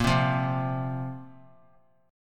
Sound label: Bb Suspended 2nd Bb down; strummed